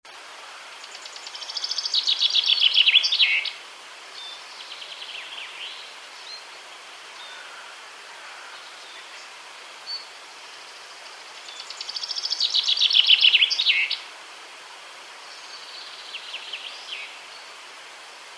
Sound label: Fringilla coelebs